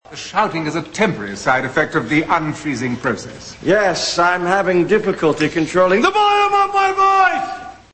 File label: shouting; Austin Powers; "...I'm having difficulty controlling the VOLUME OF MY VOICE"